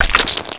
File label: Locust Hit, game; Eft